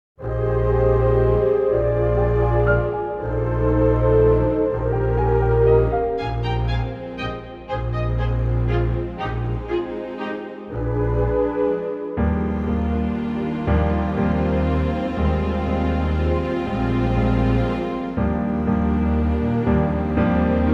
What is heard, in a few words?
orchestral Ab verse incl; key - Ab - vocal range - Bb to C; Wonderful orchestral arrangement of this superb standard from the MGM musical "Meet Me In St Louis"